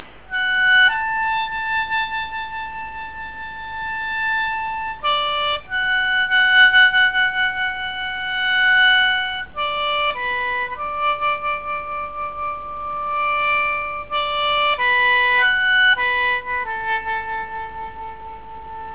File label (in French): orgue sheng